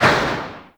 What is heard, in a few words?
SMG Tail; Weapons; Warframe; Tenno; Sundial